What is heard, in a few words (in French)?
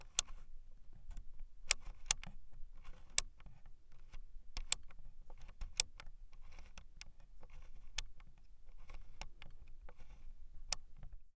REGLAGE VITESSE